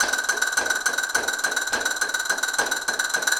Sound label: Castanets, Flamenco